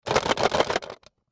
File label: Toy Briefcase Move, Toy Briefcase Shake Hard; Miscellaneous Cartoon Sound Effects; Foley; SoundEffects-Blastwave